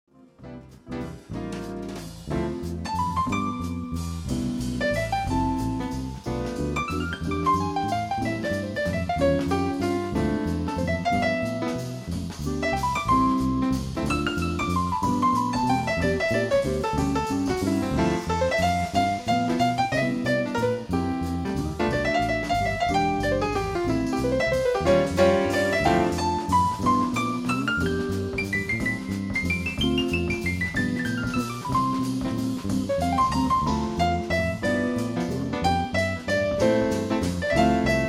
pno

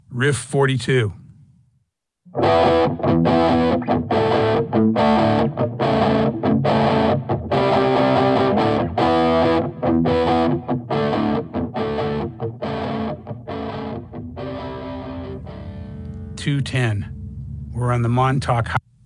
Riff

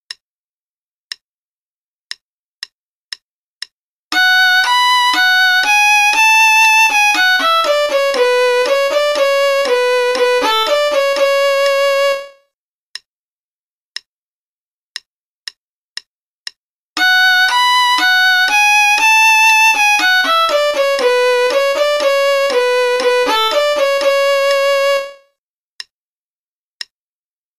Play-a-long Concerto in B minor, qn=60; Rieding

